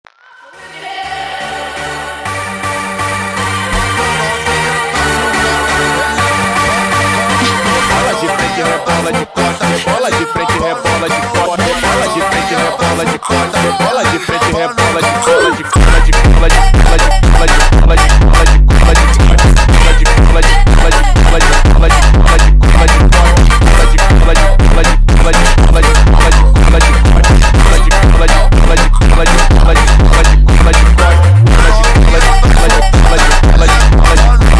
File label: Funk Slowed